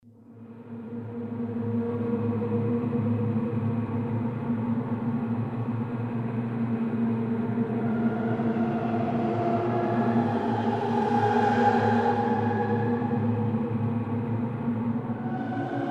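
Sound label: Deep Amazon (Temple) - Ambience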